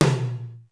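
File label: high flat, tom; sr16 tom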